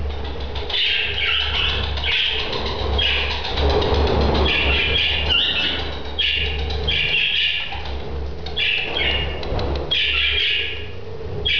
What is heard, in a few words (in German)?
aechzen wellensittich